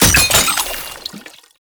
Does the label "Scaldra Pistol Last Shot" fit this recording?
Yes